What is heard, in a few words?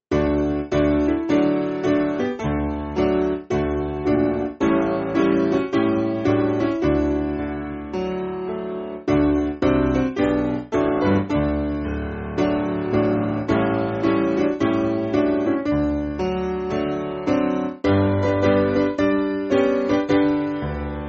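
Piano, Free Music Backing Files for Churches; Tell Me The Story Of Jesus; Sweney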